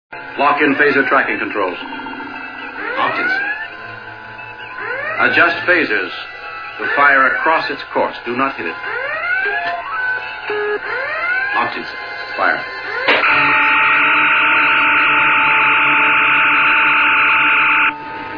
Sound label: fire